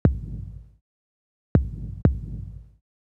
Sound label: Kick; hydrogen